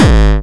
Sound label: Hard Kick